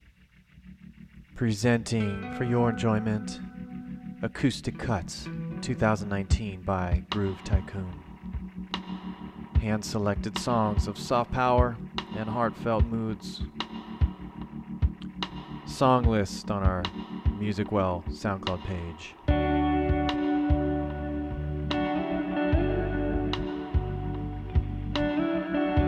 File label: acoustic cuts; music well groove tycoon